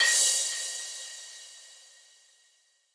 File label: FX Hit, Dancefloor Pop; Remixlive